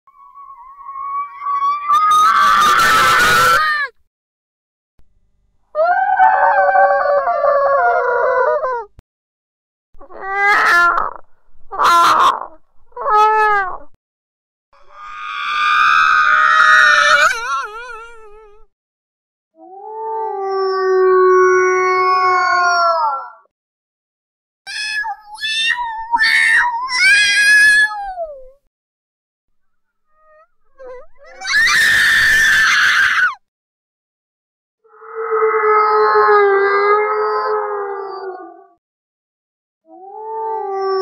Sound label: horror sounds suoni horror suoni paurosi scary sounds creepy sounds